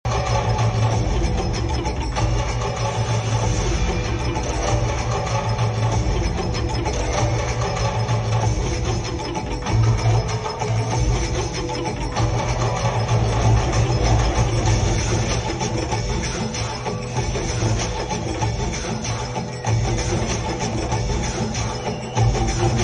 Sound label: intro bgm ringtone, tamil; captain miller